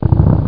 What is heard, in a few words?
fart; HotLeather2